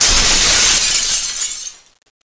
casse; Emme Arc En Ciel Et Le Lagon Merveilleux

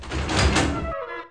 PORTE; PC Gamer 49

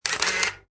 bruit

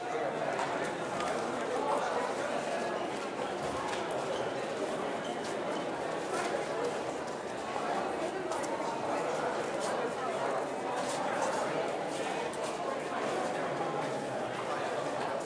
FOULE

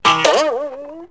canard; MOBICLIC; MOBI JINGLES

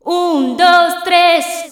chante; MOBICLIC; langues

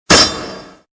epee

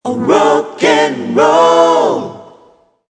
Choeur; Mobiclic51

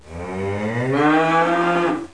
MEUHH; MOBICLIC 13

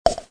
son choix; Mobiclic

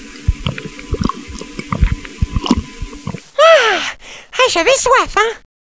bruit; MOBICLIC37

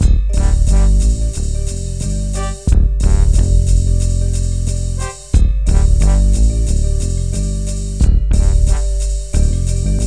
MUSIK CYBE

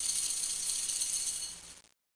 ouverture

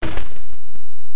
pas terre; Time Machine; Bruitages

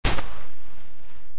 pas hom sable; WehikulCzasu; Time Machine; Bruitages

